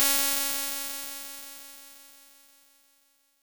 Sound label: unfiltered String Model